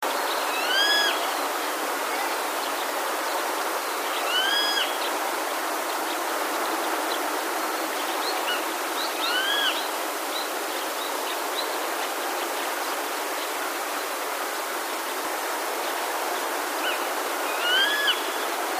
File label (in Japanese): sashiba